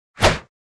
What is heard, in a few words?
attk